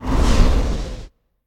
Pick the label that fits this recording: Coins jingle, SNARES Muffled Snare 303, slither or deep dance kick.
slither